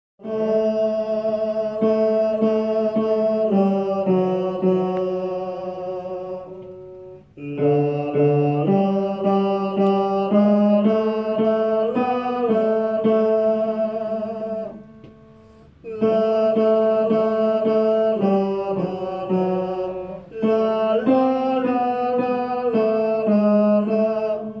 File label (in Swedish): tenor